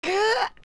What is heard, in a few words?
Yawn; Adult Link; Link yawning (3 parts)